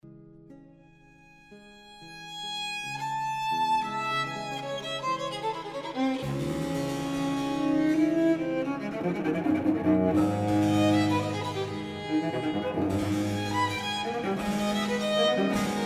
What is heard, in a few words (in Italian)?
Sinfonia a violino violoncello; Alessandro Stradella Sinfonia a Violino, Violoncello e Basso en re menor